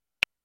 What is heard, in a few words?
Pi!; Prototype